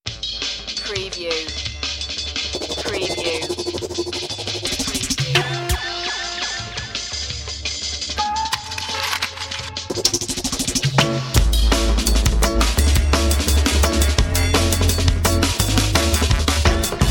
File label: step up no vocals